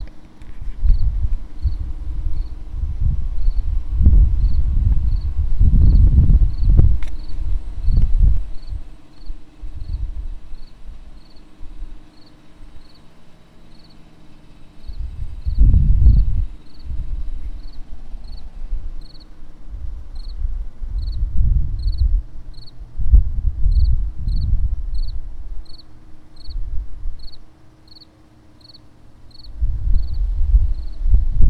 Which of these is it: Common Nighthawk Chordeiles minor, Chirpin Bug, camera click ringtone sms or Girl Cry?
Chirpin Bug